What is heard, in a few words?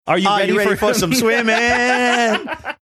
singing are you ready for some swimming